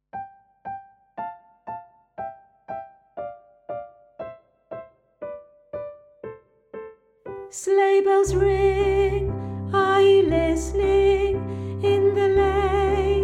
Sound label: vocals backing